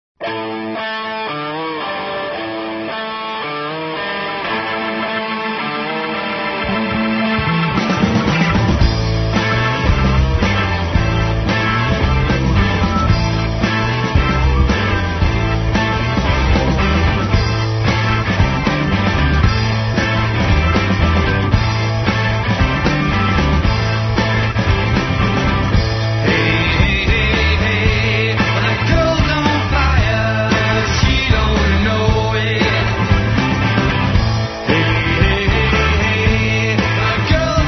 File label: Live Stream; RKC